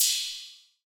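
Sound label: Hi Hats Open, trap drum kit; MZ BEATS - FREE TRAP DRUM KIT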